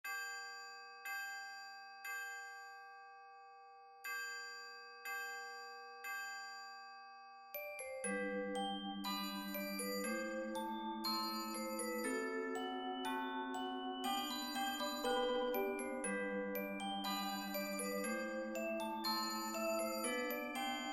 Percussion ensemble 9 Players, Instrumentation: Bells, Xylophone, 2 Marimbas, Vibraphone, Chimes, Triangle, Ride Cymbal; Level: Easy